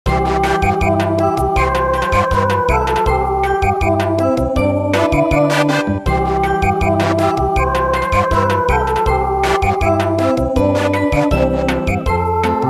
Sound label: Samba